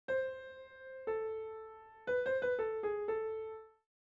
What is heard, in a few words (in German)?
Rhythmus Ubungen; Notenlesen lernen